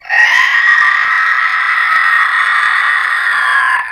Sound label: nightmare screech High Pitched